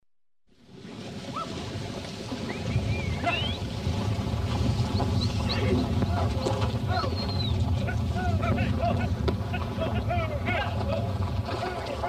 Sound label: Cowboy; Rosegarden